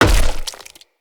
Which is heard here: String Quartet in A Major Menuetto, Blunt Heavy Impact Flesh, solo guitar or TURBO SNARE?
Blunt Heavy Impact Flesh